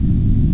Thrust; GROTIC

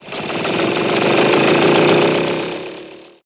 mower; BESTSHOT; noisybit

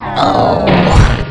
DEATH; QUAKE2; megaman

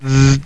Zap; SimCity 2000

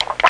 swallow; Exile v1.1.3

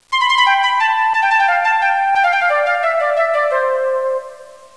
FLUTE; music

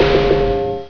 clear rows; Tetris Max 2.3

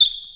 squeek; CD Action; nbajam

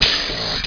buzz med; yoda

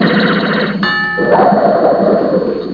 ding whoosh

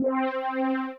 string

